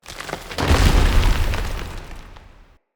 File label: Shrine Temple Door Open; Glades